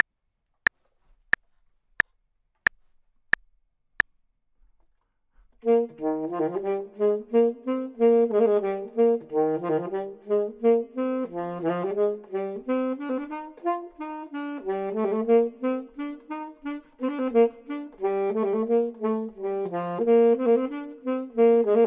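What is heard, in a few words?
bottom, Saxophone and Clarinet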